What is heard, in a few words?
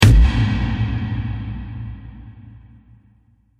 Cinematic Drum Strike Future; Low Impact Sound Effects